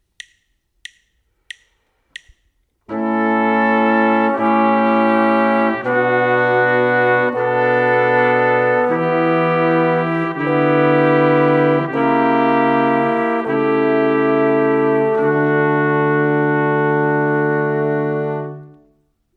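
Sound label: harmonic dictation, brass quartet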